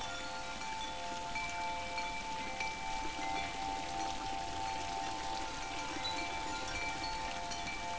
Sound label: sound lucky fountain